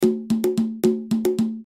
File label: Hand Drum Rhythm, Hand Drum Rhythm Loop; Musical; Blastwave